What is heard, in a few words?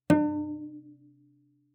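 Cello pizz fortissimo sul D D4; MIS Pitches - 2014; Strings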